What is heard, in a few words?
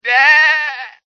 goat attack voice up